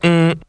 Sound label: mmm, voice; ooh ahh